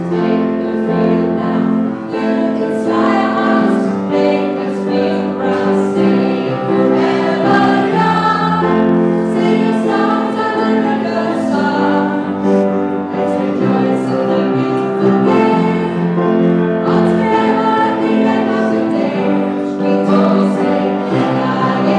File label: singing and signing assembly